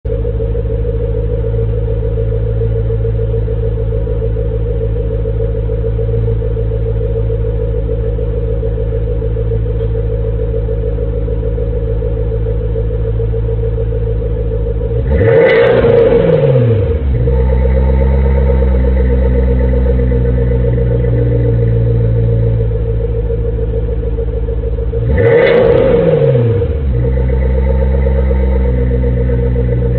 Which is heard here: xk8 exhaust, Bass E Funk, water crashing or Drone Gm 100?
xk8 exhaust